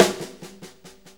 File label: 6-8 Echo Snare; Drum Tools; Perc Loops